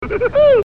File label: tigger laugh; Disney ringtones